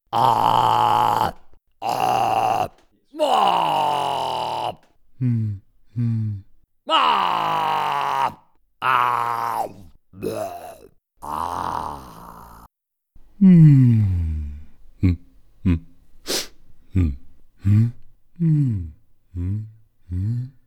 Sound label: Small Human Monster Sounds